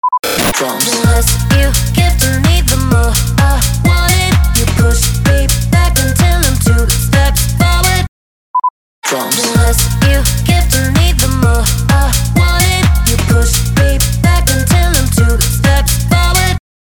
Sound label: DRUMS 127BPM Bbm